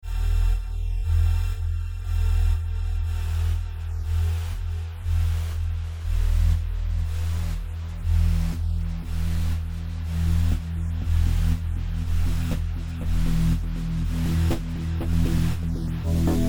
Sphere Gruv; NI FM7

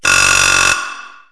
CHISEL; KABOOM! - Wild and Wacky Sound Software; citylife

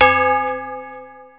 bell

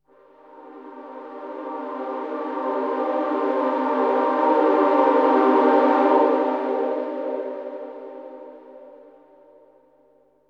SYNTH PAD AMBIENT 160 C, Ambient; eJay; Sphere

